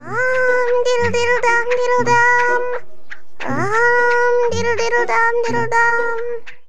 Jugglebug hum

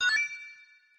Collect Coin

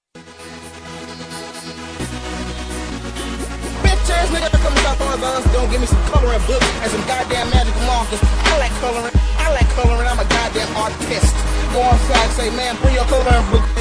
black man angry song remix, ringtones

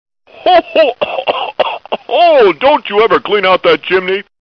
ho ho cough cough

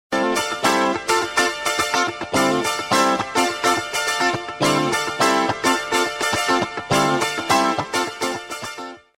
Punjabi Music

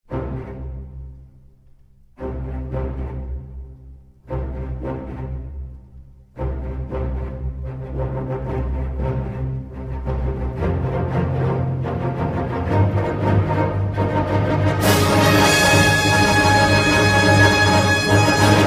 msgalert; ringtones; sms; superman

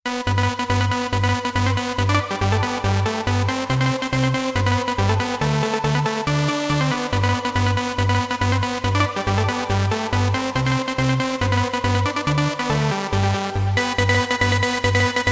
Jingle Bells

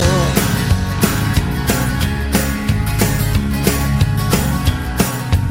Love Music Tone

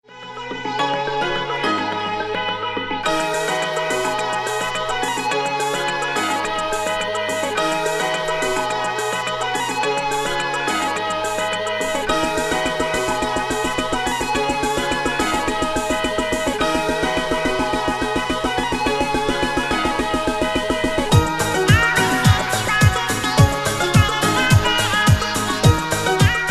dance mix; hip hop

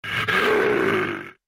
Ralts Growl

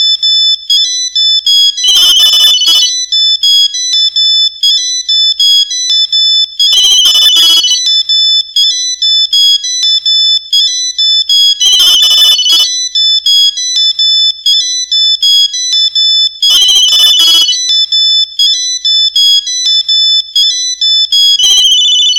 ALARM CLOCK

